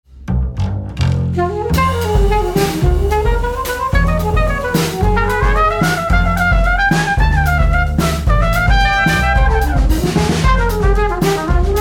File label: Quartet; Zykle Prelude I